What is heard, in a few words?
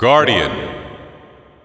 appear guardian; Drunken Robot Pornography; Inago Rage